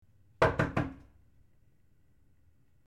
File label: Several Light Knocks, impact